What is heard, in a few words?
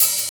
OHAT; East West